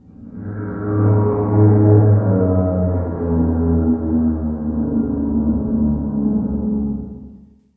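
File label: ambience zombie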